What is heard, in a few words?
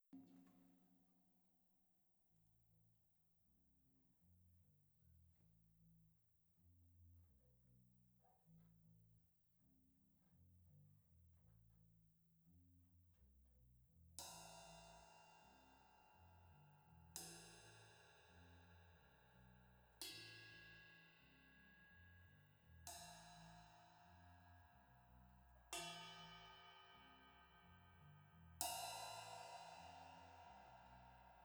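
Rode OH stereo Drums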